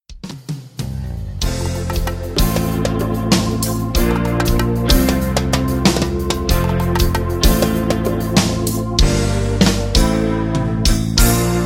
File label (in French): Mélimba